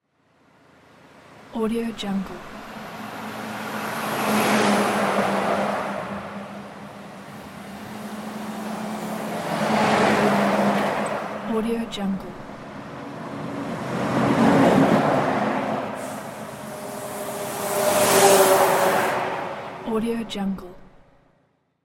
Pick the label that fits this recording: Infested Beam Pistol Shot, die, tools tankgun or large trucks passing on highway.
large trucks passing on highway